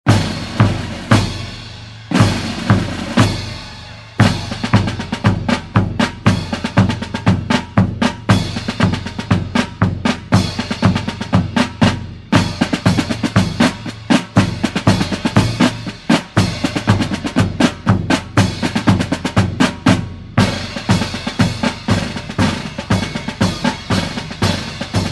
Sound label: Drums On Parade